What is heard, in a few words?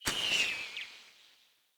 Bow Corrupted Arrow By; Weapons; Enemy; Bows; Hunter Disguised Bow; Dendrit